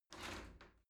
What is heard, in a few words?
shower slide door slide open soft